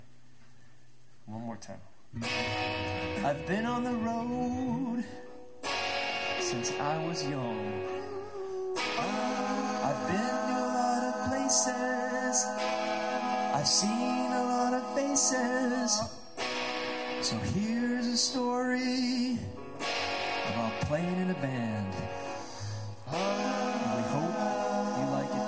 harmonies 3 and 5

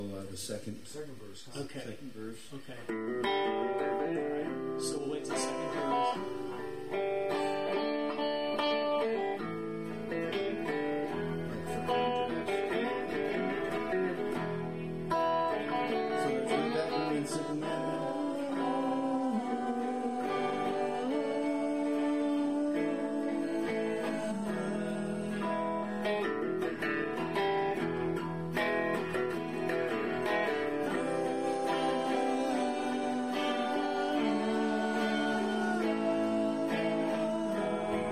angelic backup vocals

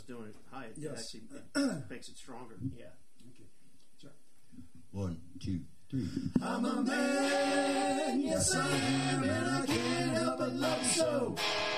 ending harmonies only

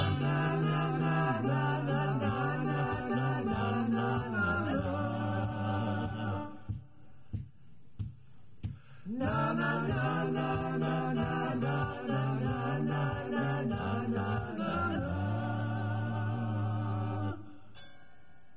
Harmonies NaNaNa

